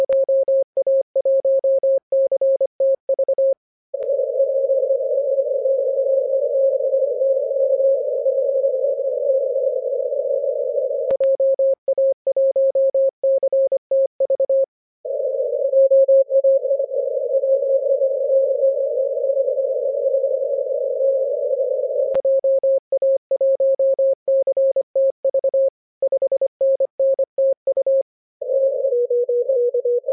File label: 7mhz; dxcc